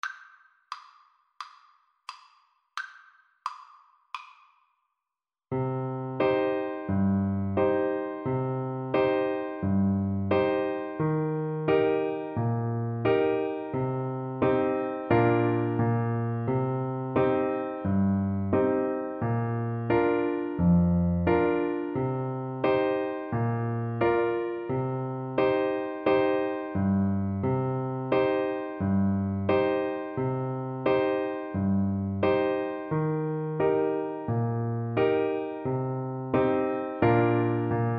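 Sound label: alto saxophone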